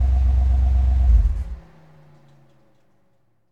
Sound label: 91 Firebird Off, Automobiles